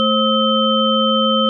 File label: Diamond chord; Diamond Sign Chord